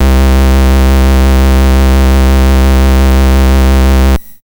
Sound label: SID Bass C; CHIPSHOP HITS